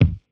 Jazz hop kick; Hiphop

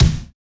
kick, house

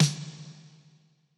snare; pasific; Pop

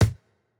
Jrock kick

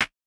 Modern clap, Electro

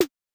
Korg snare; Electro; Micro korg

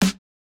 snare, snare house

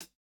hihat, house

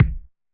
Hiphop kick; Reverb+EQ+Comp+Limit

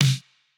snare; Reference Beat BPM120; j city pop 1980

